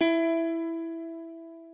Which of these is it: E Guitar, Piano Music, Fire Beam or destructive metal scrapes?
E Guitar